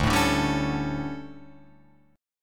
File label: D Major 7th Suspended 4th, Guitar, Open F; DM7sus4 strummed